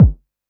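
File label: vermona drm1 mk3 bass drum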